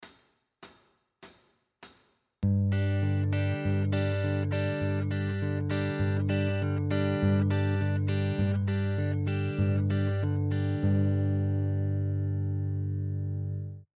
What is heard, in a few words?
Backing Track; bluegrass guitar mastery